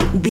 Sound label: footstep metal low run